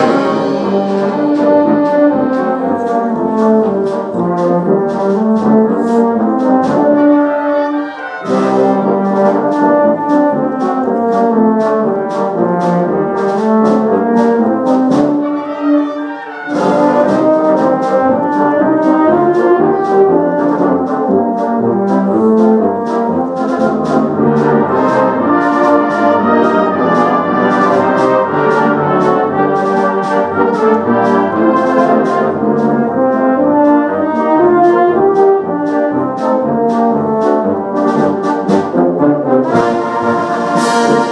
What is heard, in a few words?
the new england brass band just beginning their lovely christmas concert